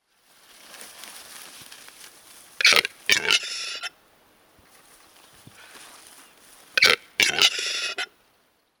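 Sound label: sounds of capercaillie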